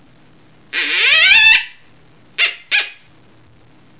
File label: Male Defiance, chevreuil